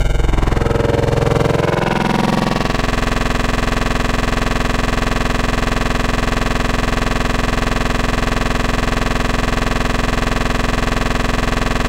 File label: Digital Bass Angry; Wavetables; Synthetic's Serum Collection; ESW Core Wavetables; Echo Sound Works Core Tables